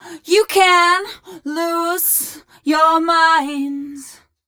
f mel a flat, fem Vocals

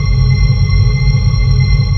DEEP T C3, SYNTH; Q Up Arts; Dream Experience

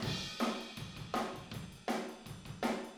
Room ST, Drums, 162 BPM; Drums from the Big Room The Mixes; Take Your Toms Off; Chorus 01

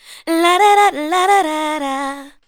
ADLIBS 90; Ueberschall; Jam Box

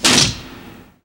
METALLICS; Northstar; The Wizard VOL-1

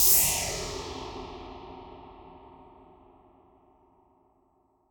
Noise Verb Decays

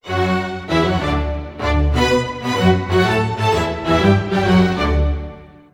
95 D maj strings, Orchestral arrangements

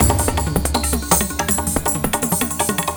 Stem 162, breaks; minihalfs

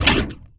snap, percussive

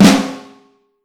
Vintage Snare Drum, 6.5X15; Q Up Arts; Johnny.C's Vintage Snare Drum; 6.5X15 LUD

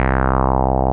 BAS, Eurotech; Sounds Good

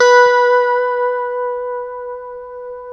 B JC120; Guitars; Spectrasonics; Hans Zimmer Guitars VOL-1; JC-STRAT MF

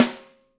wood; Sampleheads; Peter Erskine Living Drums

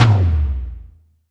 DM5 Tom Hi, Alesis DM5 drum machine

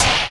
Trap Snare; Sonic Foundry (Sony Creative Software); Esoterik Beatz; Trap Kit

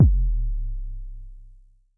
K Quasi Sub, Vermona Mono15 Drums Kicks

